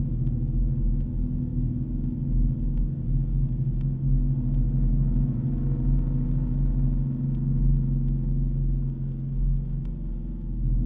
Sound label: Room Tone; Vivisector: Beast Within